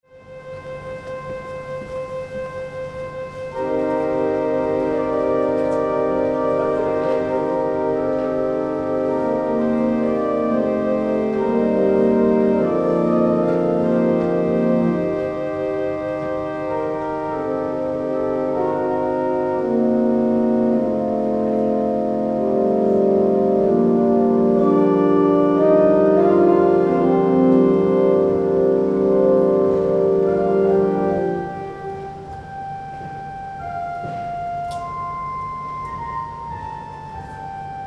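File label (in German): Wordsworth 10 II P, pfeifenorgeln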